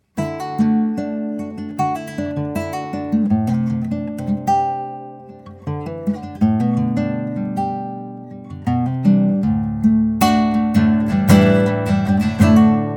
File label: Guitar